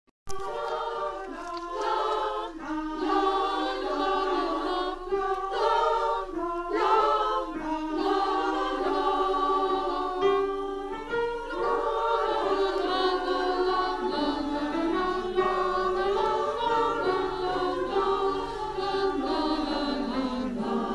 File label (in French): esta noce 3 voix